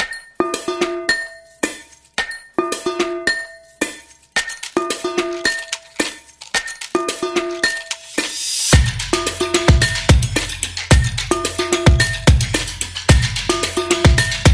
Kitchen Music

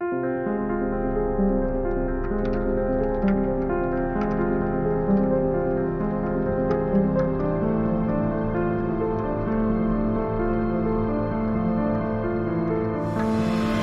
The Thriller Trailer, fear

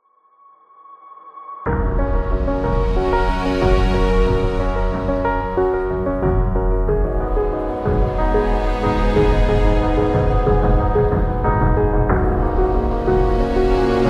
Calm Documentary; dramatic

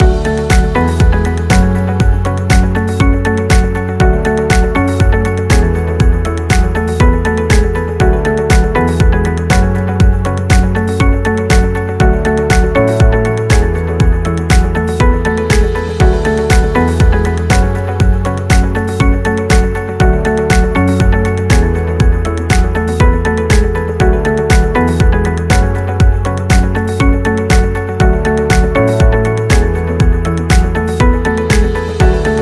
Corporate Positive Loop; Promo

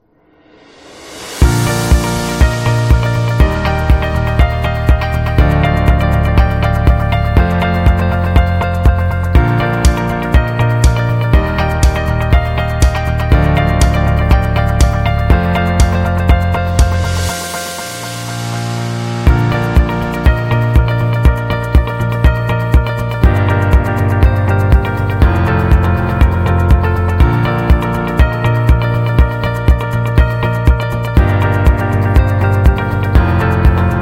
Corporate Culture; corporate success

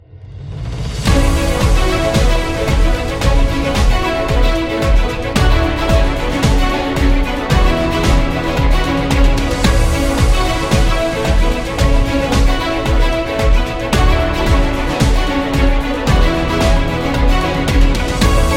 Corporate Cinematic; technology

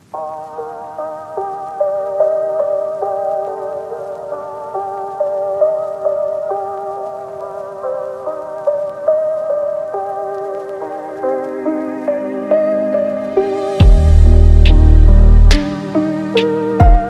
Soft Beat

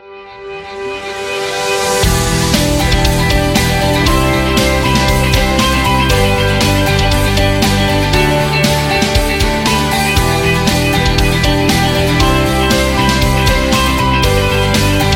Inspiring Rock; eco-friendly

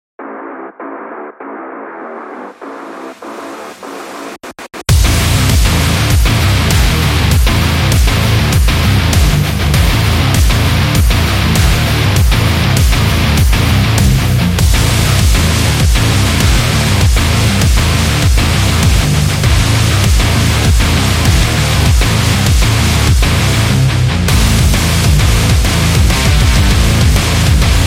Agression, metal

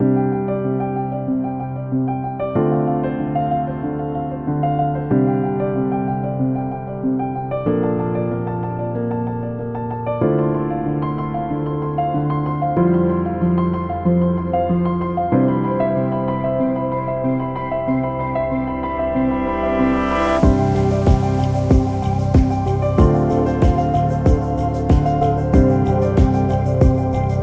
Background Chill

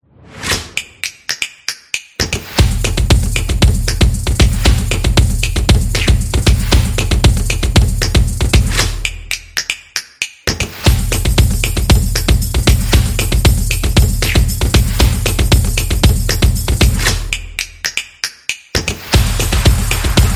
Drum Energetic

